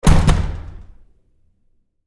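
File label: Door Bathroom Stall Close Close Up Hard; Household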